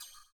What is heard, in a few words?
CYM, Ethno Techno; ILIO - Ethno Techno